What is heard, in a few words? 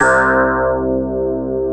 META LEAD C3, Analog Fantasies; USB Soundscan vol.49; AKAI